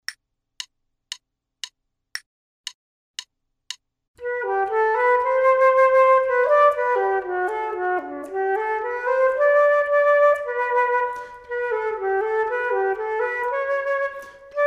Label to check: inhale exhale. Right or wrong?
Wrong